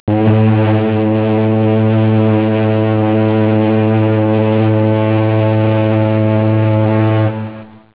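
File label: ocean liner, boats; EFFECTS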